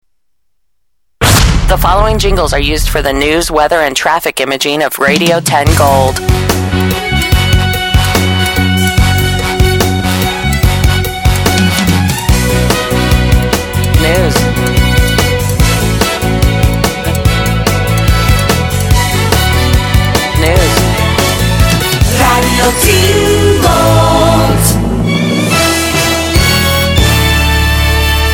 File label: Jingles News Weather and Traffic; Radio10 Gold